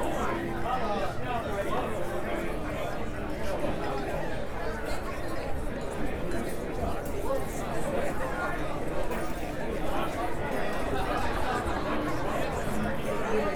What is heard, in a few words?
tavern, ambiance